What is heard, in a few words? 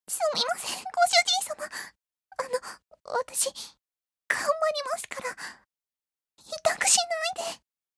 aaaaa